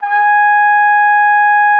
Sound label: TROP G#5, TRUMPET; Xsample VOL-3